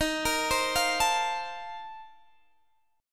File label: A sharp Major 7th Suspended 2nd Suspended 4th